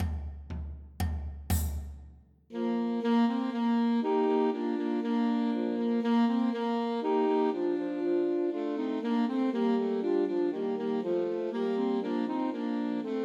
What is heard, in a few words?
The Holly and the Ivy Christmas Trios for Three Alto Saxophones